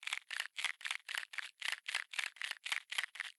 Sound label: Mines Laser Bug Crawl Loop; gamerip